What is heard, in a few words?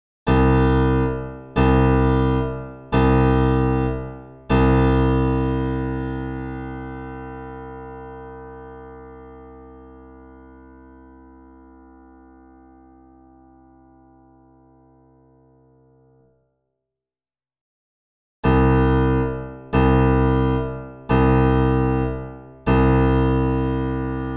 with and without metallic noise